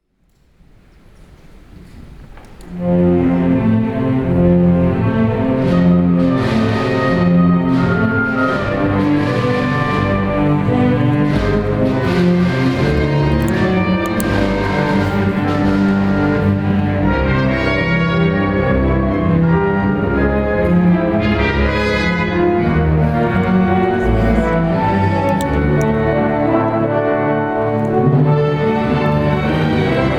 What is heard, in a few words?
FANFARE; young europe classic 2026 im konzerthaus berlin